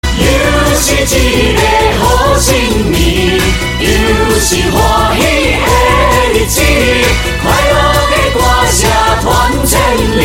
ringtone

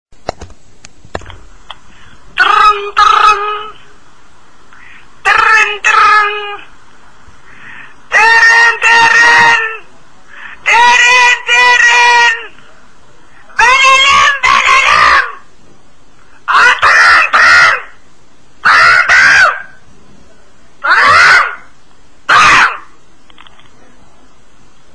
random funny ringtone

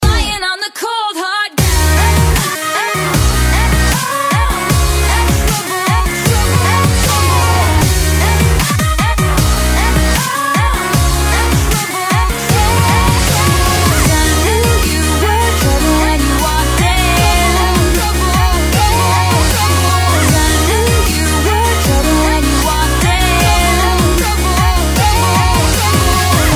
dubstep remix ringtone

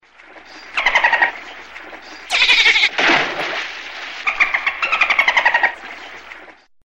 dolphins notification ringtone

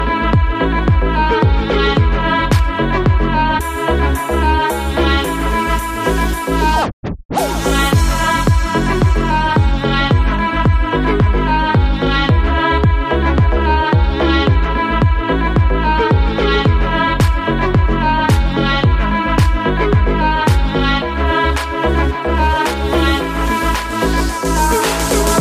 instrumental ringtone

